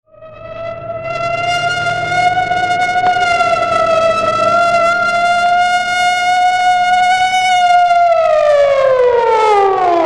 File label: scary horror siren sound ringtone